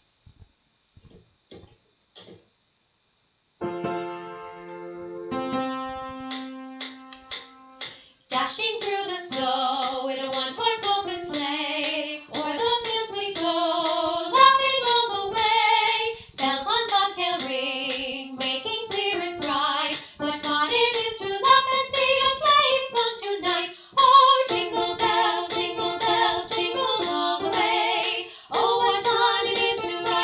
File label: Bell Medley, BOTH PARTS TOGETHER